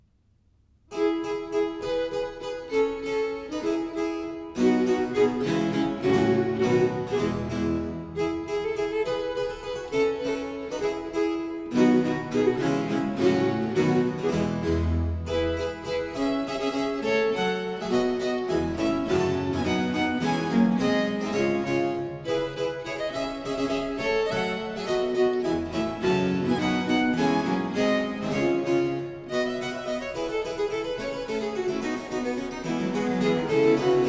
suite no. 4 in four parts, in f major iv. sar, english music for viols